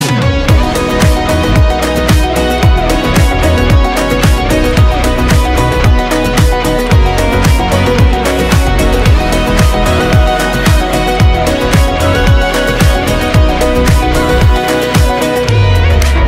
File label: instrumental ringtone; War 2